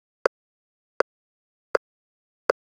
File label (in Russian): Метроном 80 ударов; kazan